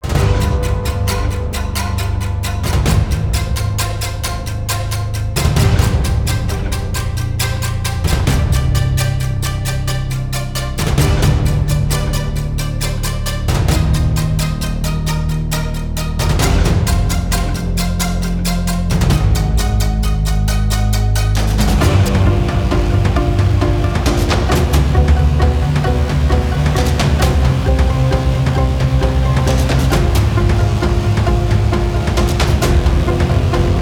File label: Dark Tense Dramatic Building Film trailer; CUES for film TV games etc